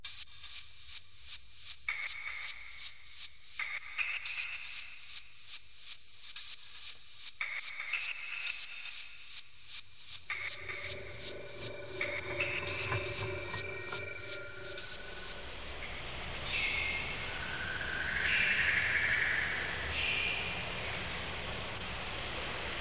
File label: teleporter; Rumbles